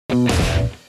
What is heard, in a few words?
a rock, jingle